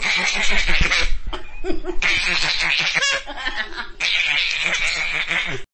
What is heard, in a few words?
Silly Baby Laugh